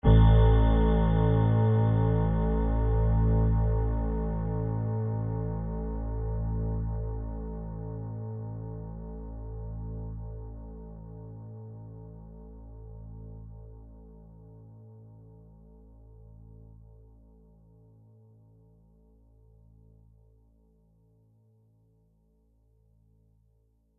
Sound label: sylfid sustain